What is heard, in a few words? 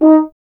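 French Horn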